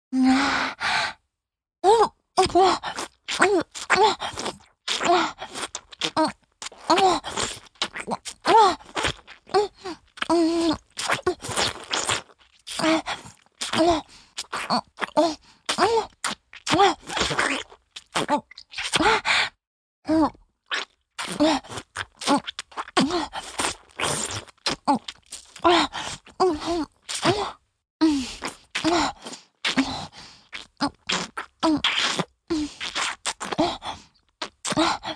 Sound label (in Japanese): フェラチオ(強):頭を前後に激しく振って陰茎を深くしゃぶる; 実用ボイス抜粋集